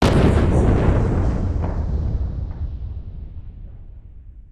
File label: camshake; spaceship